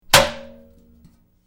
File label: Metal Short Impact Ringing, Hollow metal hit with short, reson; Metal sound effects